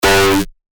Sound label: bass shot C; revealed bass shots vol 4; Sentry